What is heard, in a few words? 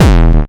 Bassdrums Hard Kicks; Vengeance Samples